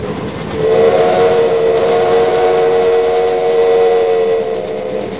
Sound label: train